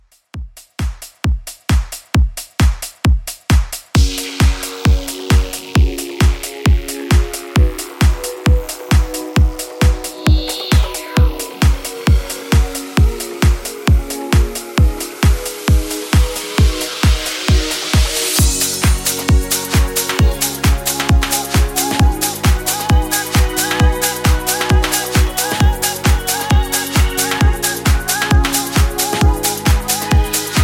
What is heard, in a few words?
90s extended mix 133 bpm